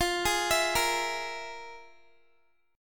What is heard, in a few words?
F Minor Major 7th Double Flat 5th Guitar; FmM7bb5 arpeggiated; x,20,20,21,22,x; 4F,4A,5C,5G,5C,6E